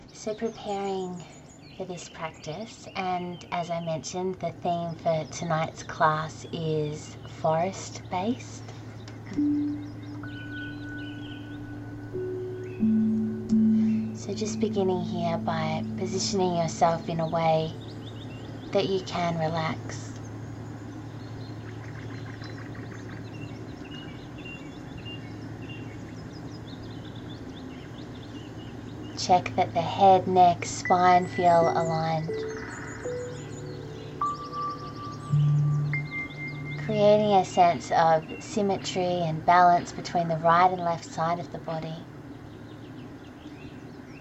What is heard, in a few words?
Forest